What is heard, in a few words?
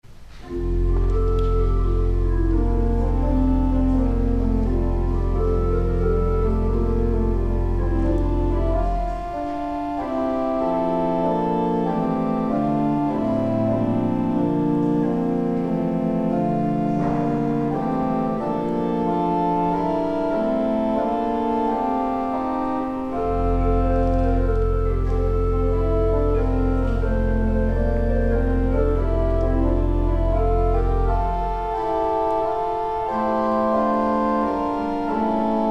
Organ, Chorale Prelude; Paul Manz; 'Comfort, Comfort Ye My People'; St. John's Cathedral, Jacksonville Florida